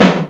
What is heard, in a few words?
Drumulator Snare, EMU Drumulator drum machine; 300 Drum Machines